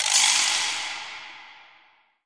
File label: Processed Ethnic Percussion; Doru Malaia Percussion